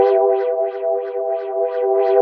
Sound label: shimer